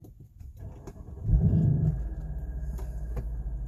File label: growling sound start up trouble startup; sl-class r230